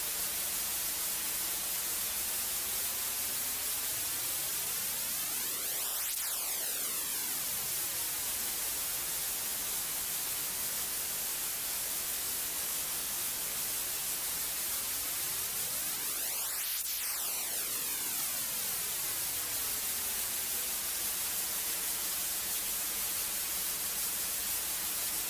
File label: flanged white noise; flanger